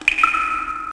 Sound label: DRIP; thief